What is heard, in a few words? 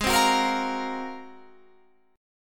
Ab 13th Ab Guitar Half Step Down